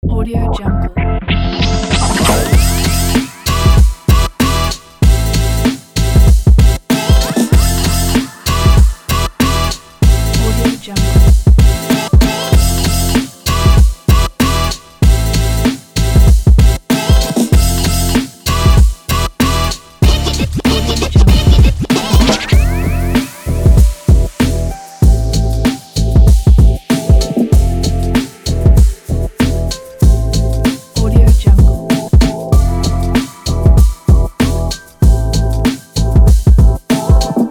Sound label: that beat